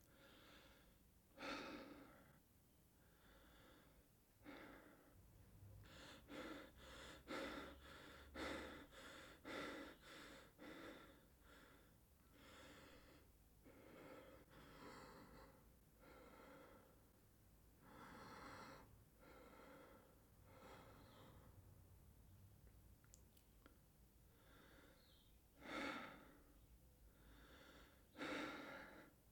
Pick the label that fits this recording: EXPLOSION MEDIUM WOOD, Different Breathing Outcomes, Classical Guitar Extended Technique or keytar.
Different Breathing Outcomes